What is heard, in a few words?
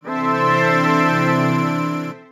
ability cleanse